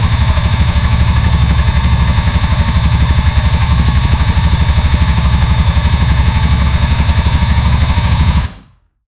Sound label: audio of a powerful v8